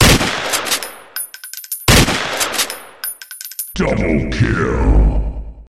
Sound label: 2 shots; Double kill